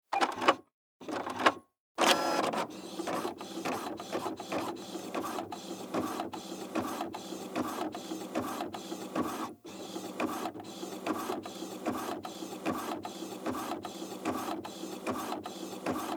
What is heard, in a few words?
Office Fax Machine Print Page Lexmark X4270; Fax Machines